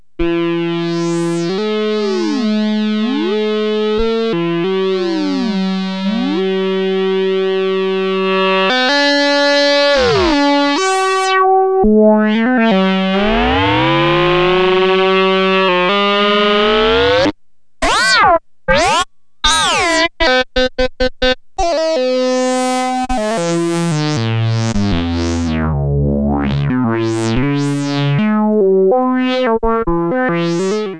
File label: ringmod; nebulus